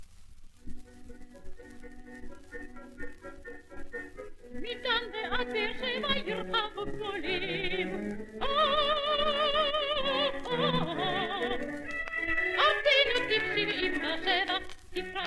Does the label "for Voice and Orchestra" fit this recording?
Yes